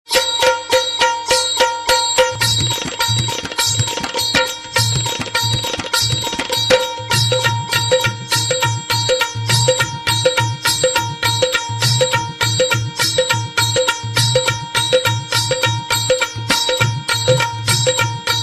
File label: dholki marathi; ringtone